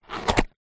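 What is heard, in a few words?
egg carton cardboard open; zapsplat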